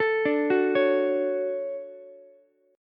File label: D-Major 7th, D, Ukulele; arpeggiated